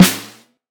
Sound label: roomy acoustic snare f sharp key